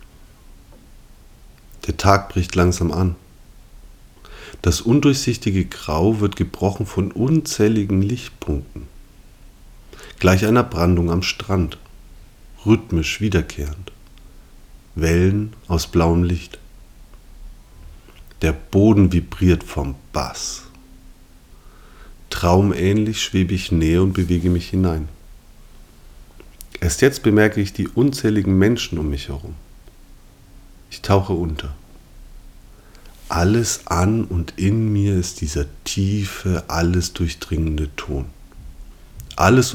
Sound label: DUB sounds